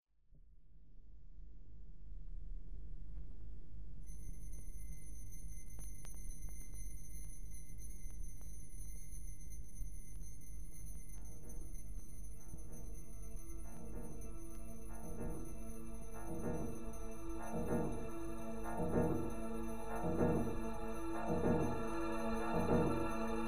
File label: electroacoustic fanfare